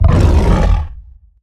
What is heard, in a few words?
Stealth Attk; Evolve; Behemoth